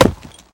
horse hoof; smite; guanyu

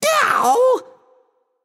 ugh, voicepack; smite; jorm

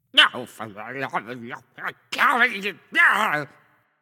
grumble; smite; xingtian

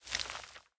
arm; Smite; Cabrakan

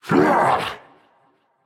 grunt jump; smite; guan yu

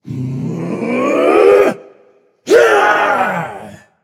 battle cry; smite

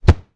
lobby defeat hit; smite; kukulkan

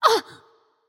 grunt hit light; smite; voicepack; hel

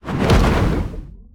Arcane Activate; smite; merlin

